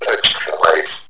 extra life; hurrican

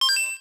coin; singe

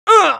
damage, pain; dialogue; mohaa

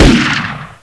rocket; xash3d fwgs; dmc; weapons

